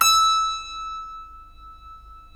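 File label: E5 clavinet right, Keyboards Acoustic; USB Soundscan vol.17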